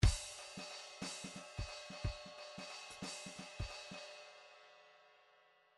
grooves 3 4

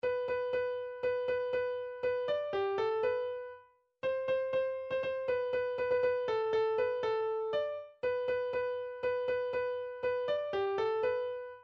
jingle bells, midi

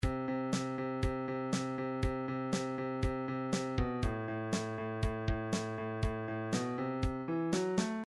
drums break, midi

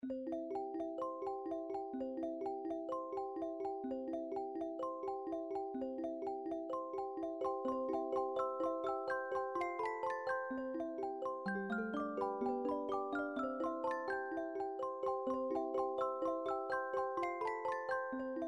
music box

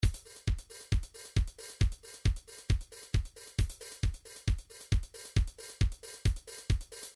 trance midi loops beats panner; Newtronic Trance Constuction Kit

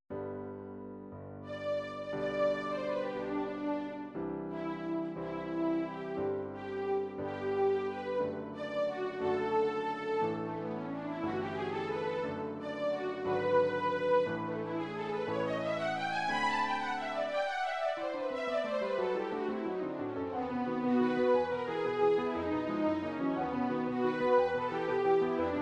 classic violin

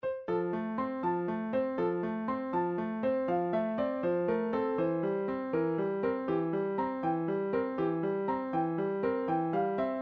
cuckoo, midi; lange; child

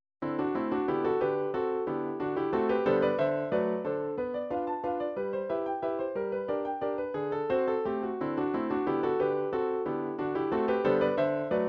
waltz, midi

